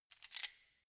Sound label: wrench close soft; schrauben drehen hydraulik